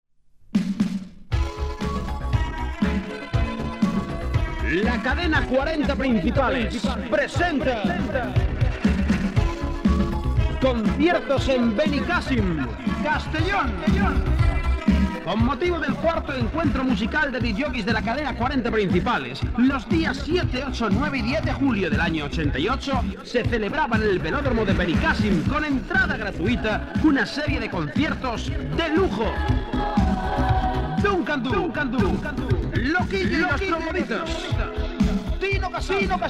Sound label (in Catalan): concert